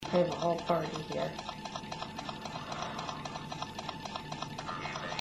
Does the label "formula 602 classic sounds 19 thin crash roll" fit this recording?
No